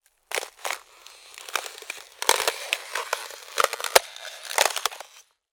nature ice crack

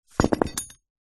weight concrete metal handle set down hard ground

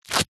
office sticky tape peel from wood surface; zapsplat

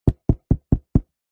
cardboard box small knocking on side; foley

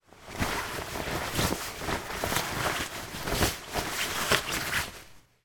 foley swimming shorts put on; zapsplat

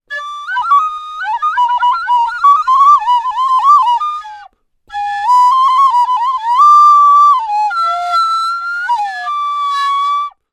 musical recorder playing badly; zapsplat

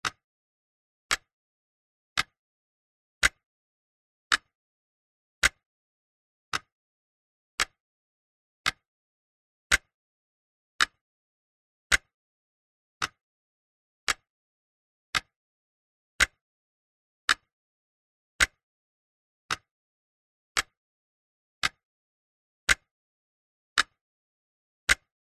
household clock old tick tock

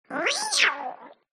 Cat Reaaarrr

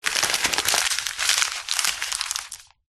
Paper Crumble

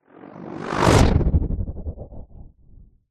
sound design sweep chopper stutter dark

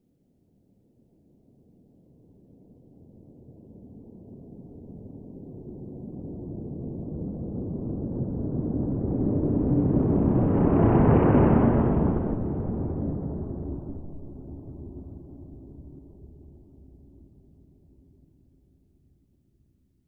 science fiction spaceship mothership pass by

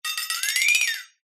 cartoon flexatone

